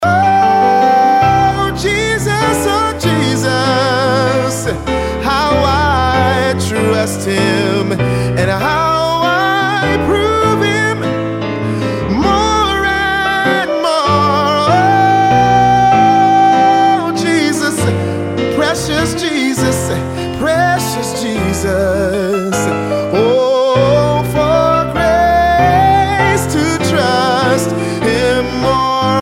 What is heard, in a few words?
ring tone